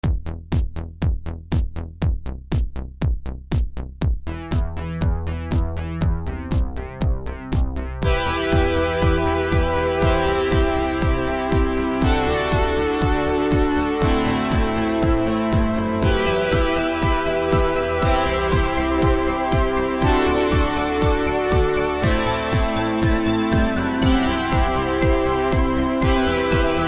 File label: synthwave